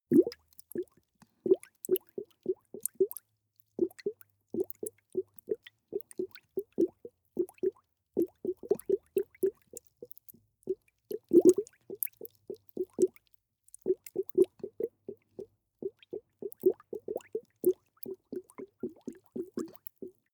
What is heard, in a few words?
small bubbles sequence